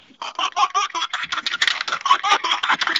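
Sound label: little vampire laugh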